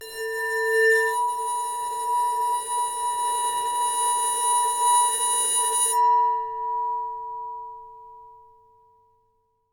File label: Bowed, Large Wine Glass